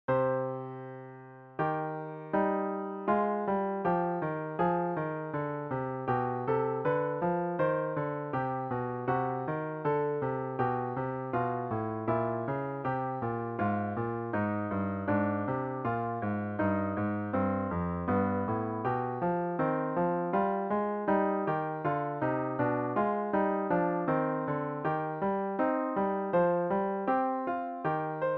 Canon in miscare retrograda; UCMR - UNIUNEA COMPOZITORILOR SI MUZICOLOGILOR DIN ROMANIA

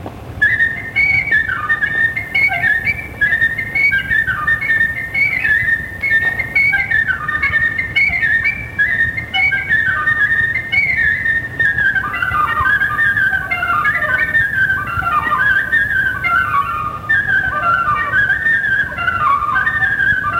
Batuta, joc popular vasluian; Centrul Judetean pentru Conservarea si Promovarea Culturii Traditionale Vaslui

